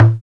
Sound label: TEK TUMBA; Roland - LA Composer vol. 1